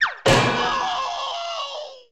cartoon pain; Crash Bang Boom

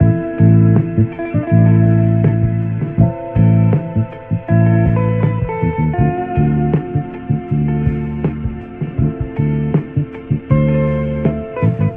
guitar, Phonky Loops

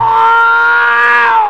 "wow" SCREAM

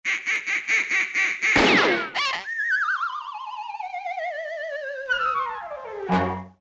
duck hunting

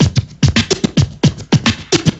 scratching, Funky Drum loops

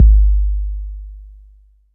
rummage; Heartbeats

